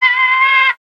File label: Amb Animals; Red Orchestra Ostfront 41-45